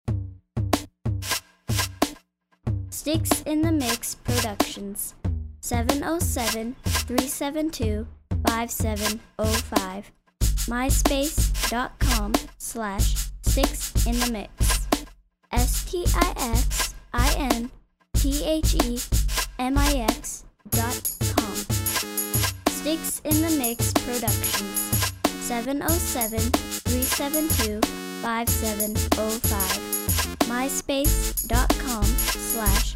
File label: slam crunk